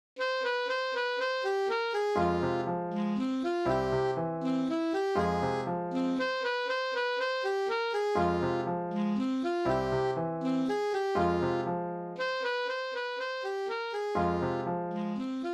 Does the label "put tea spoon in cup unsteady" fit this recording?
No